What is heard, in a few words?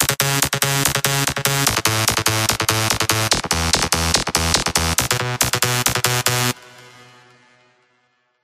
future rave